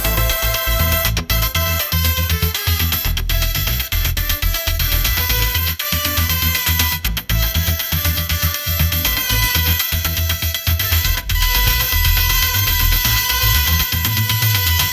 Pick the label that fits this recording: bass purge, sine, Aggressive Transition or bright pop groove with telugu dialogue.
bright pop groove with telugu dialogue